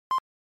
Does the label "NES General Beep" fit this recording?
Yes